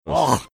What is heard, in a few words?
making gagging sound